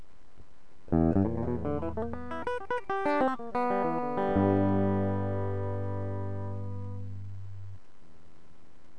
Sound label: speed electric